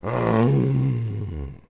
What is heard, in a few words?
Groan; Tingles Father; Zelda; MM